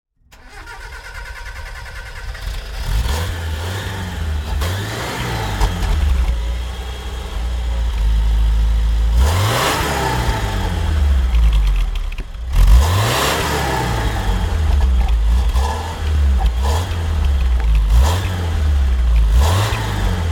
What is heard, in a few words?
Mitsubishi Sapporo